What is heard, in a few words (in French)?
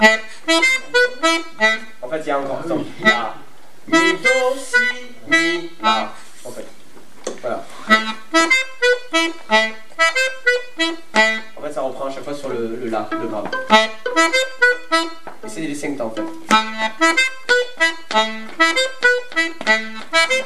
violons